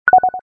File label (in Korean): 공 받기; deepsky